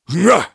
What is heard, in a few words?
Attack; Voljin